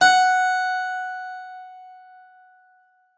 SPIN F5, SPINET; Xsample VOL-7

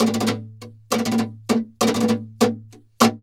flamenco guitar, duende fx; ProSamples vol.46 - Flamenco

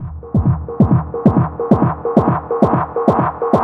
Snare Roll, Trance; Sonic Foundry (Sony Creative Software); Trance NRG VOL-2; Drums

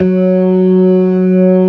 TARK, organ combos

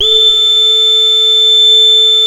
BEGIN LOOP, Synth and Organ; InVision Interactive; Keith Emerson; The Most Dangerous Synth and Organ

